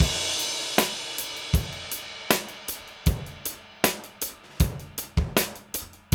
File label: DOWN KIT 78; AMG Gota Yashiki Groove Activator